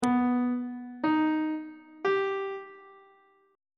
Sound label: c flat augmented triad chord intervals; on treble clef